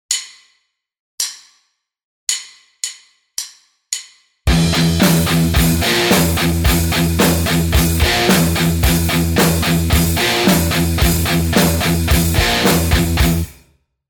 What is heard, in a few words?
Riff, guitar